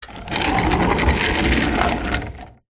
radiator portable wheels roll wood floor; household

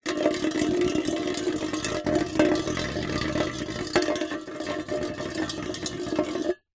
foley tin roll concrete close up

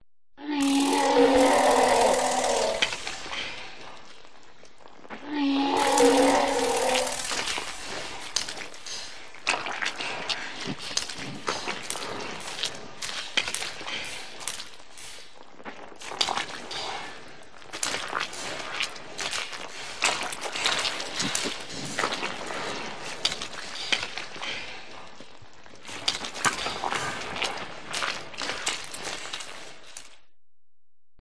Monster Eat; audio hero

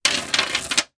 industrial chain med drop on hollow metal; zapsplat

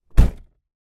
transport car door close internal peugeot 307

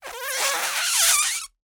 cartoon squeeze squeak

